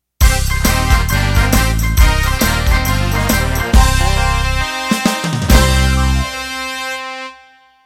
Shuffle End

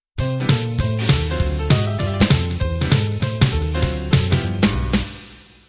bop intro

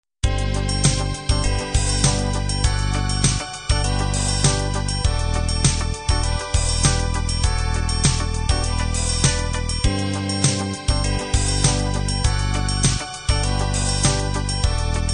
windy beat

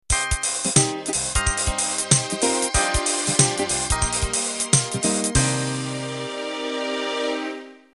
Swing Rock End

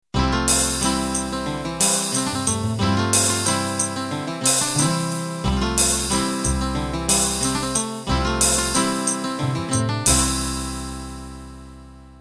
8Beat slow 70s End

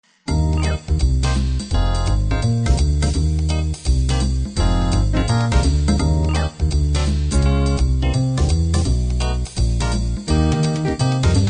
b3 jazz

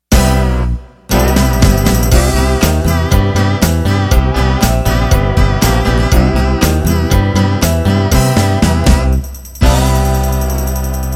Modern Country End

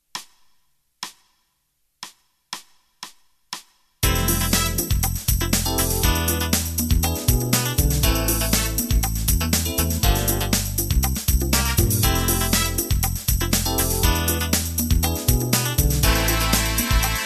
Acid Jazz

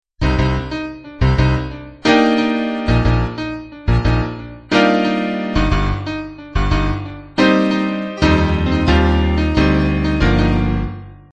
piano jazz-pop intro

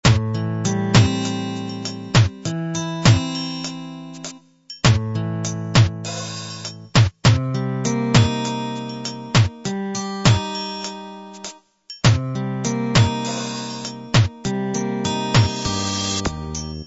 16 beat ballad intro

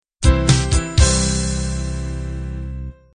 bubble gum end

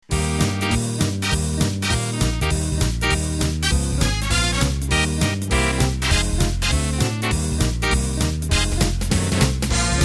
Quickstep; Fingered Bass, Brass, Jazz Guitar and Saxes and will work on fast swing tunes, jump blues, and quick standards

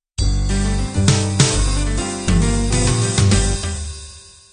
cool jazz intro

